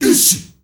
ISHIISHAA R, GRP CHANT, Heart of Africa; Spectrasonics; Heart of Africa 1

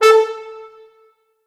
Orchestral Brass

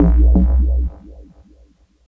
SFX, 170 BPM, Drum & Bass; Ueberschall; Drum & Bass Flash Trackz

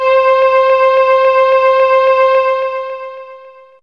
Viol Sus C5, Fairlight CMI IIe Hi String; 12HiString

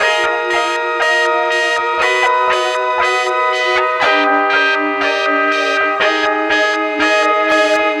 Darkprog, Pads; Sonic Foundry (Sony Creative Software); Synchro Funk

